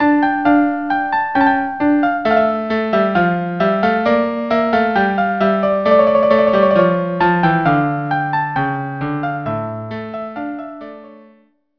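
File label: violin cello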